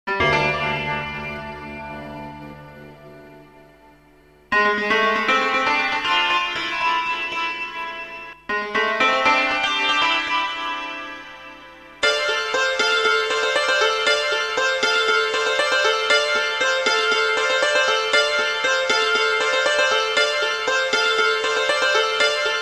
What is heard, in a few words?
Cymbalum Mystery